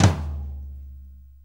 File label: 4-4 Tom Flam; 4-4 Drum Loops; Flams; Drum Tools